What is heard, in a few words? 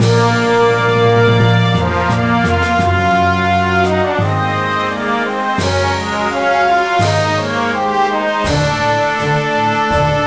BGM Title; Shin Sengoku Tenka Touitsu Gunyuutachi no Souran